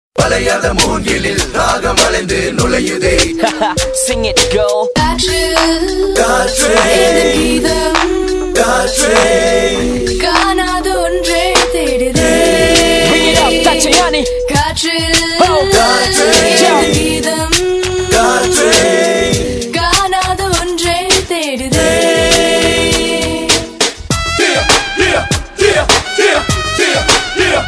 Tamil Rap

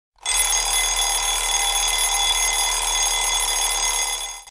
Noisy Alarm

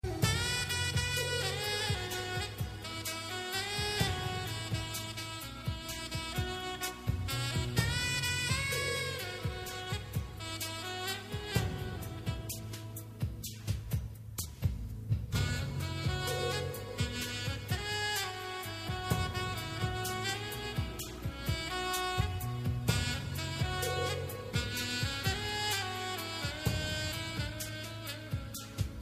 Soft Music

